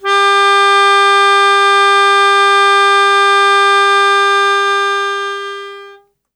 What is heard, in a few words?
Melodica G Hit